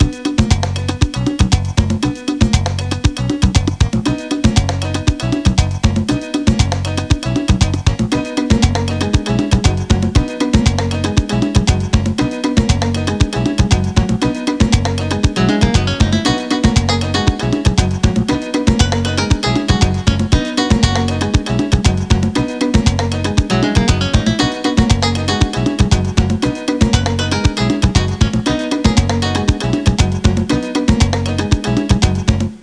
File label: SAMBA